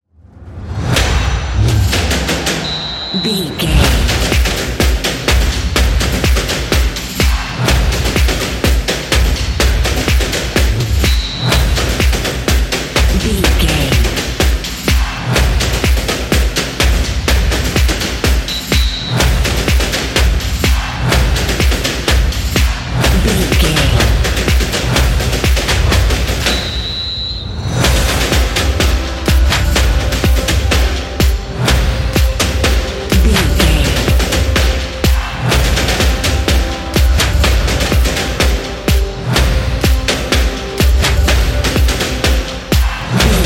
football march

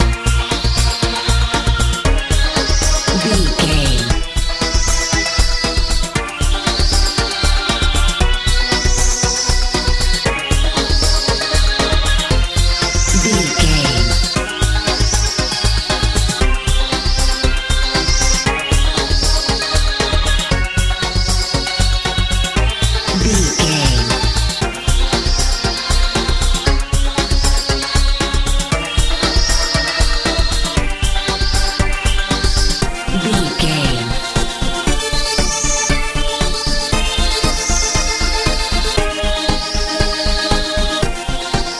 modern pop

